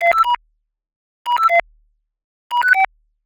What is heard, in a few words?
interface querry; sonniss gdc